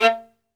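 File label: SOLO VIOLIN, Synclavier Strings; ILIO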